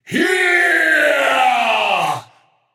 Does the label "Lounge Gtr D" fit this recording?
No